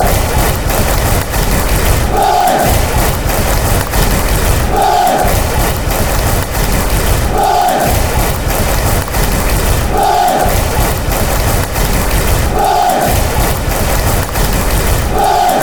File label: clap, chants; bury